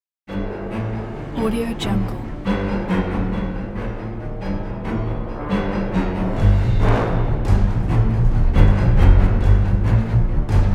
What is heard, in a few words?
horror opening credits